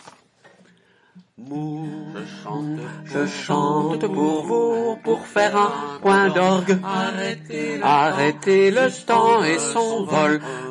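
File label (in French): HOMMES soprano alto, chorale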